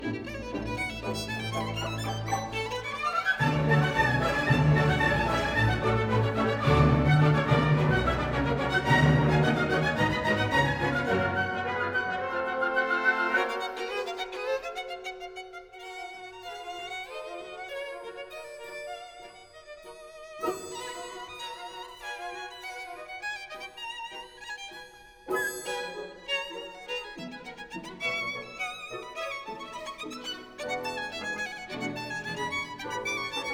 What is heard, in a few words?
Violin Concerto; Violin Concerto Op 28 III Allegro moderato